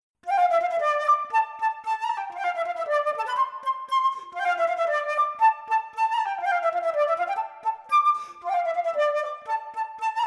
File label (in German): Keltisches Volkslied Flöte solo